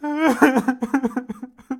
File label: cry male